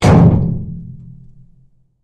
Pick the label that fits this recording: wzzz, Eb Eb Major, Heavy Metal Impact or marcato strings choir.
Heavy Metal Impact